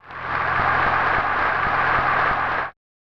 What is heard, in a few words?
Applause